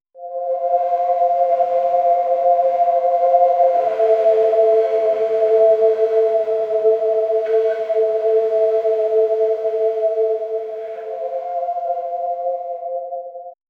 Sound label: necklace hologram, holography